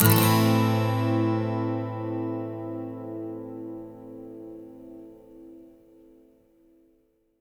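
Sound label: 12 string minor, Acoustic Guitar Loops; USB Soundscan vol.04; AKAI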